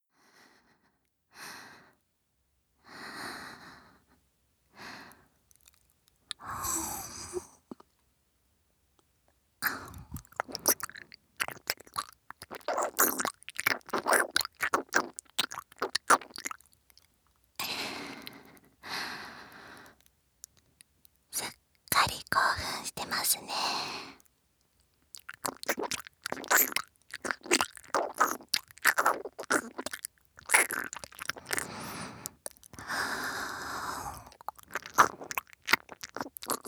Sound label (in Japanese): 耳舐め（ソフト）